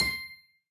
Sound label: Combo Sound; Candy Crush Soda